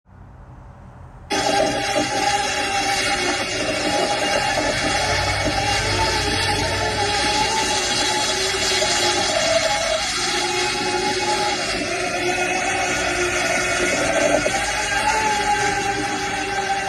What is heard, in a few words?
Drone delivery